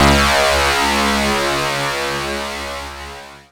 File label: C#, Synclav FM PLUCKED; Big Fish Audio